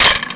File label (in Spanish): puerta